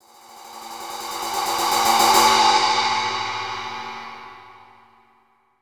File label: ride